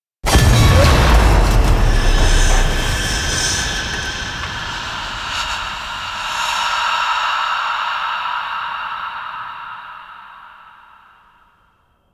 Explodes; Drifter Lotus End

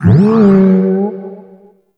UI Close; Shroom Prince; Warframe; Nokko Colony; Vendors

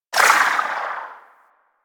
Sapient Pistol Tail; Warframe

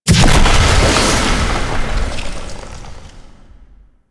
Void Dragon Firebreath Cast Ice; Enemies; Abilities; Warframe; Duviri

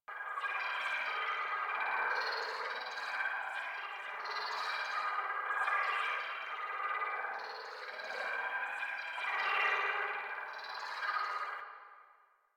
Aura Projectile Loop; Warframe; Anima; Anima Animus

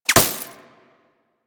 Laser Impact Glass Large; Warframe; Weapons; Bullet Impacts

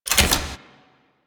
Fist Equip Flip; Enemies; DE; Warframe; Tyl Regor; Fists

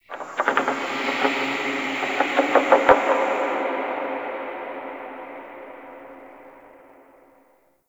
Under City Texture Mid; Ambience; Dungeons; One Offs

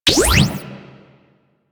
Fire, Weapons; Lato Prime

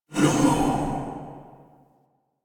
Fire Whispers; Weapons; Warframe; Tenno; Grimoire

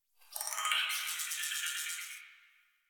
tree critters angry; Ambience; Props; Warframe; Duviri

